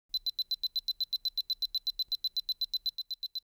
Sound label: Alarm